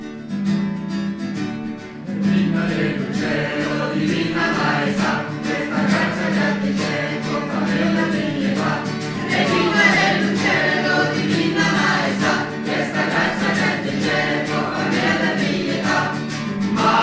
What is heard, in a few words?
ensemble; Madonna della grazia; St Jean